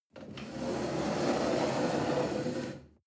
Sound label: chair wood slide on floor soft